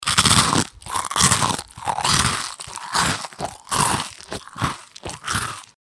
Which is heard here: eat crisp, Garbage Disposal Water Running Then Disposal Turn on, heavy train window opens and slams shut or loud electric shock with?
eat crisp